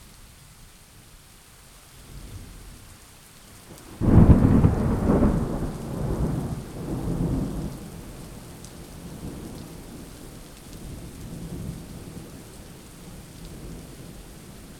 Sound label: Thunder Storm